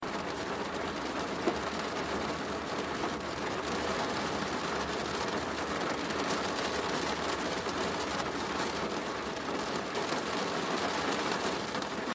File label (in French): JINGLE PLUIE